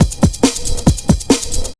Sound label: Big Beat Drumloops, 138 LP; USB Soundscan vol.25; AKAI; MOVE 138